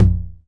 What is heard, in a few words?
909 MIDTOM